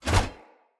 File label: Corrupted Battle Dog Paw Swipe; Combat